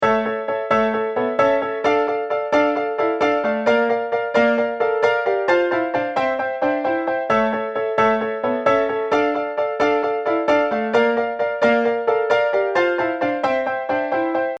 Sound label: piano riff